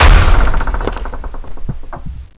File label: explode